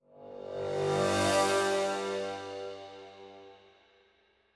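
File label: music draft ban to pick transition; champ select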